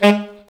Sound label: SAX A. STAB, Alto Section; Alto Sect FX; Roland L-CD702